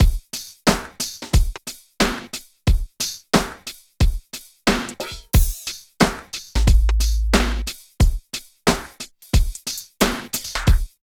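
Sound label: LOOP, left; East West - Phat & Phunky CD2; 90's Sample CDs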